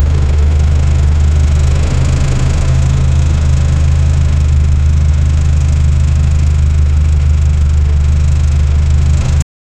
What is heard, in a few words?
authentic wwii spitfire; aviation